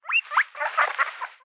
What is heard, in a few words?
morče guinea pig